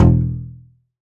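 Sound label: Contrabass Balalaika E1 Mute; Planet Earth; Digital Sound Factory Planet Earth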